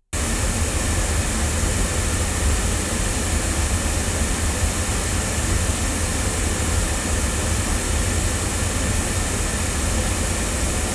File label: Sontronics Orpheus omni loudspeakers